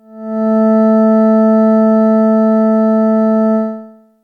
Organ 220Hz

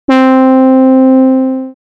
Dream Brass

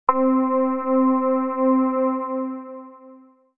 Moonwalker, synth lead

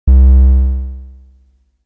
Tristar, synth lead

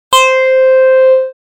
Jaw, synth lead

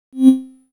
Soft C, synthlead

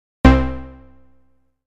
Three Pulses, synthlead

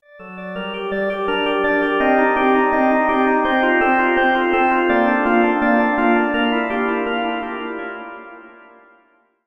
Ganymede Organ Alpha

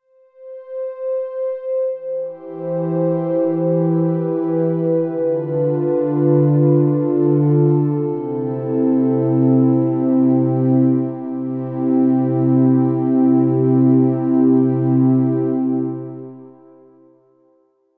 String Pad Piece Rev, synthpad

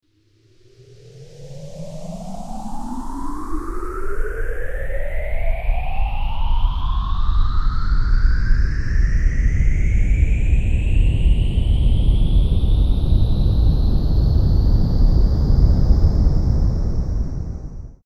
Spaceship

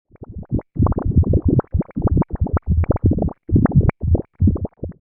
Magic potion

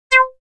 Shorty, synth lead

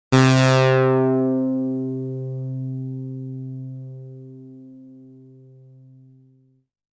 synthlead

